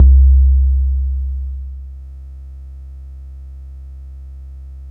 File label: SUB C3, SUB BASS; Explosive Jungle; USB Soundscan vol.05; AKAI